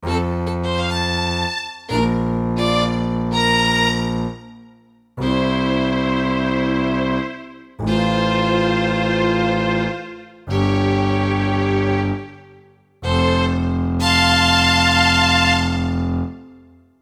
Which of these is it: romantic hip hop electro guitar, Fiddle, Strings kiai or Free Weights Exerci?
Fiddle